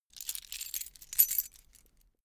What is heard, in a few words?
key ring large pick one; objekte; schluessel